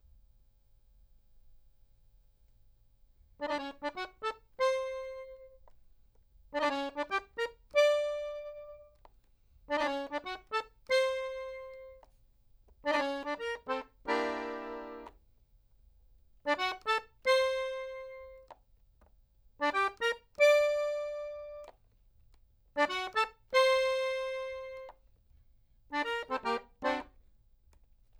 Accordion

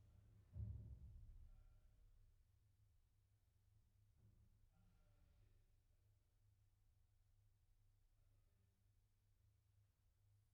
Piano

